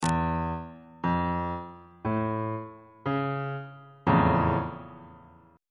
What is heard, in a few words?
f augmented major 7th 3rd inversion on bass clef